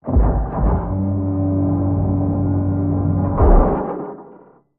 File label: delivery drop; Original TK Sounds